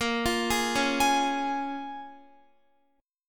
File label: Bb Minor 7th Bb, Guitar; Bbm7 arpeggiated